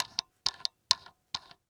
Technology Electronic Light 150 Watt Fresnel Light Knob Losing